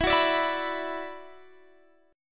E 7th Suspended 2nd E down Guitar Standard; E7sus2; strummed

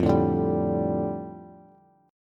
F 6th Add 9th F down Bass, chord; F6add9 strummed

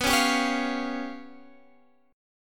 G 7th Flat 5th

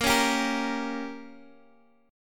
Bb Minor 6th, Guitar, Open D; x,x,8,7,10,8

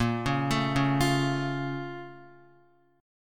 Bb Minor Major 7th, Guitar; BbmM7; arpeggiated; x,1,1,2,1,1; JGuitar

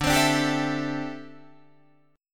D 9th Suspended 4th D down Guitar DADGAD

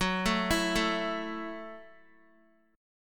F sharp Minor 7th F sharp, Guitar; F#m7 arpeggiated; x,9,7,9,9,x

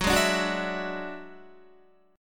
Gb 7th Suspended 2nd Sharp 5th, Guitar; strummed; JGuitar

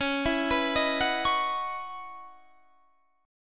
Db Minor 11th Db down Guitar Standard; Dbm11 arpeggiated; JGuitar

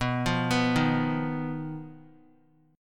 B-Major Flat 5th B down Guitar; arpeggiated; 4F,4A,5C,5G,5C,6E; JGuitar

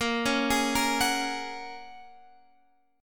A sharp Minor 7th Sharp 5th A sharp

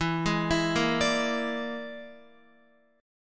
E 7th Flat 5th, down, Guitar; E7b5; arpeggiated

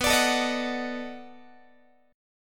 B 7th Sharp 9th, down, Guitar; B7#9; strummed; x,19,17,19,19,17

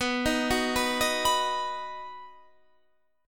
D sharp Minor Sharp 5th B, Guitar

